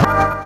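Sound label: chord stab; E Lab; XTc Files of House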